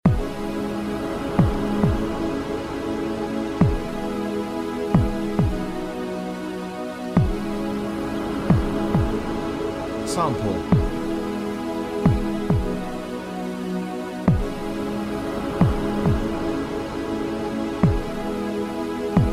wave synth

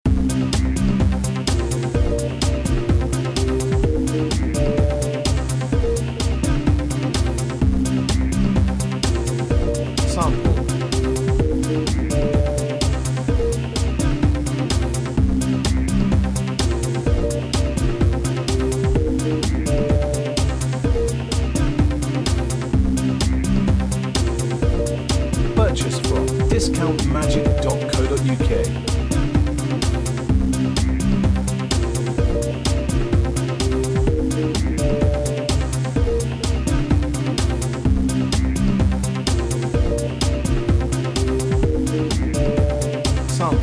electro, backing

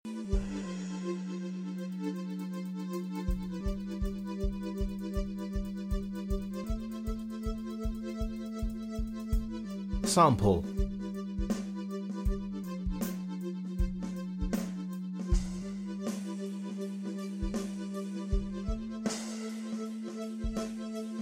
Dreamy Groove

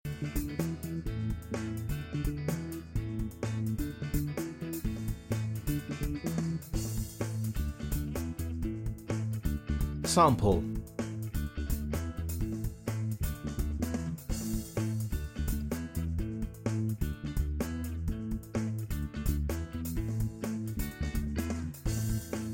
Upbeat Groove

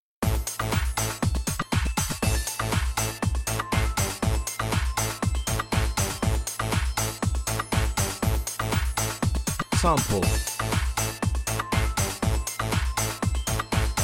electronic

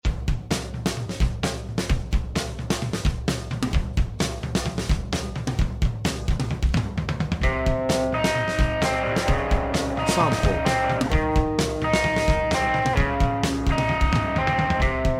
beat

